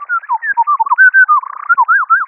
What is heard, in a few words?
wren pitch